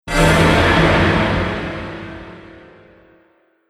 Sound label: Fantasy Explosive Impact; Miscellaneous Impact Sound Effects